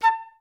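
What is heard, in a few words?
flutes staccato A4; Best Service; Peter Siedlaczek's Advanced Orchestra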